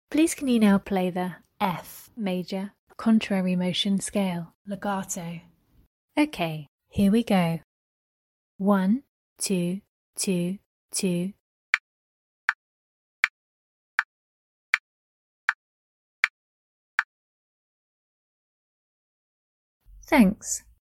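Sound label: F major contrary motion scale legato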